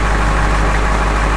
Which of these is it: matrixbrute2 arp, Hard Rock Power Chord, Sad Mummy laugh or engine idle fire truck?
engine idle fire truck